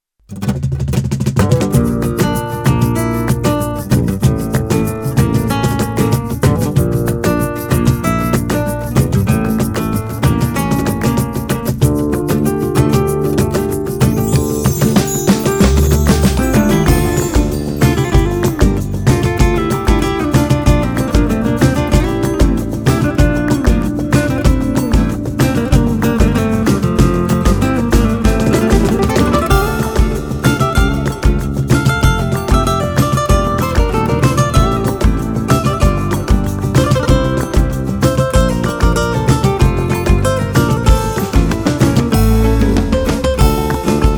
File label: Instrumental